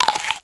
eat; nash ringo

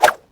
tinker throw; aura

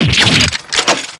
damage

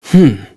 hmm

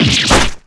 damage

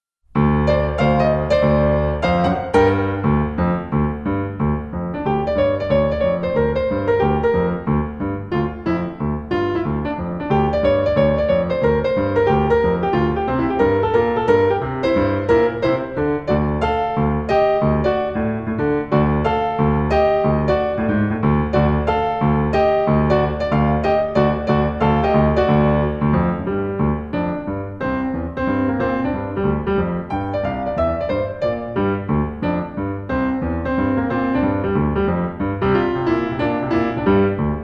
piano

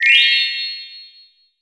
shine

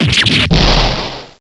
impact

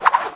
sword; buckler

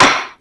gavel

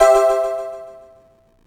happy

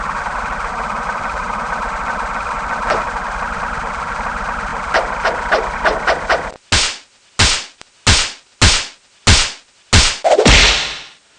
breakdown full; knight

